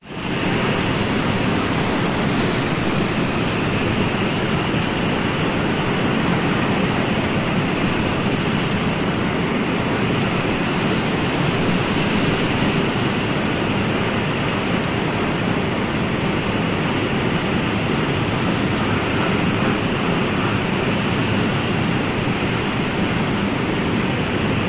nature wind blustery trees distant surf and frogs tropical after rain